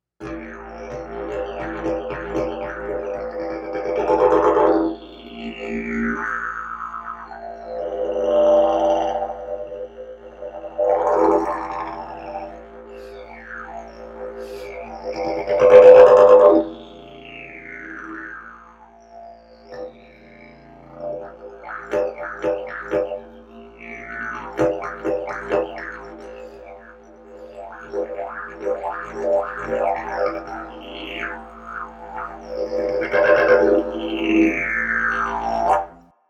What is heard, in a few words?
didge